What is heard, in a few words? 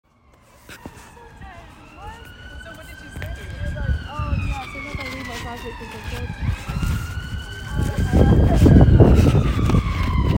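ambulance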